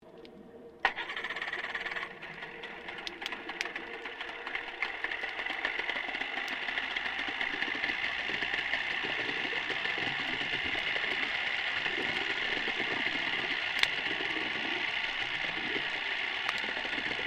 Boat Motor Outboard Merlin40 Ignition Idle Submerged; Various private boats; Vehicles